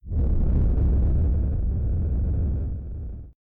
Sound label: fire